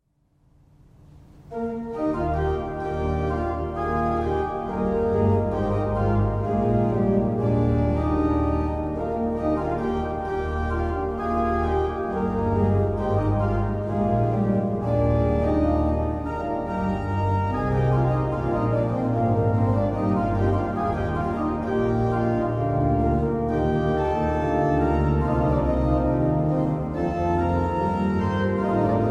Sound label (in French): Orgue